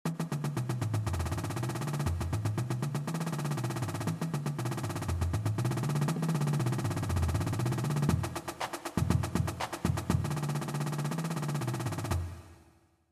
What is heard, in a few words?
EZ Rolls